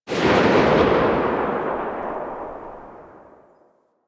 Sniper Alt Fire Tail; DE; Warframe; Frumentarius; Exalted Sniper

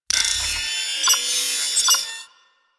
Computers Random C; Warframe; Ambience; Grineer; Grn Computer Room 1

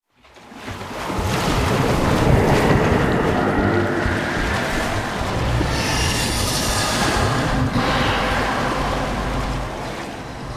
Rising Sludge; Warframe; Nineteen Ninety Nine; Quests

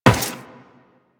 Run Metal, Footsteps; Warframe; Tenno

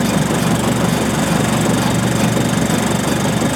Machine Loop; ambience; Warframe; Grineer Galleon

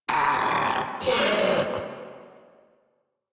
Laugh Ghoul Sarge, Barks Battle; Grineer Ghoul Drill Sargeant

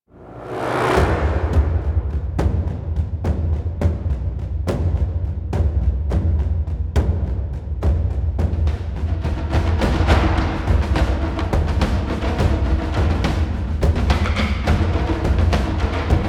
Game End You Lose, Music; Warframe; DE

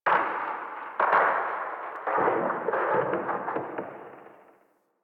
Landscape Glacier Ice Distant Medium, Ambience; Warframe; Venus Open World; One Offs

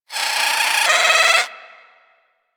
Avichaea Vocal Idle; Warframe; Hunting Critters

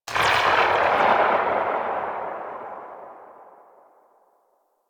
Sniper Rifle Tail; Wraith; Grineer

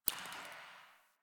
Derelict Interior Water Drip

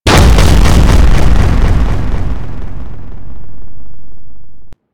Biped Stomp B; Warframe; Corpus; Ostrich; Shockwave

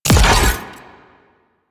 Conduit Proj Explosion; Sentient

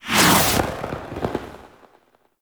Blind Arrow Whiz By; Powers; Fey; Saboteur

